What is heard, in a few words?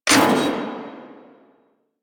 Spear Gun Mech; Weapons; Warframe; Tenno; Hoplite